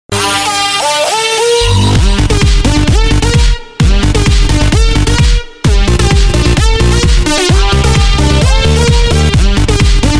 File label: Electro Remix